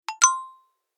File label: popup